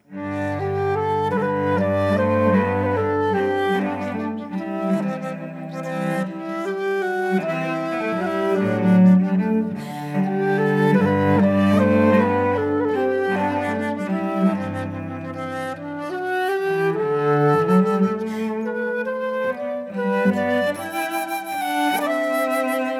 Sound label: waltz